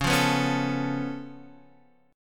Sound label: C sharp Augmented 7th C sharp down Guitar Standard, chord; C#+7; strummed; JGuitar